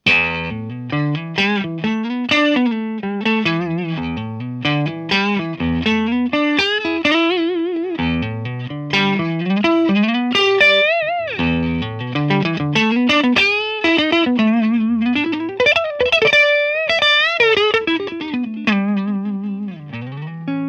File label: AC30 Blues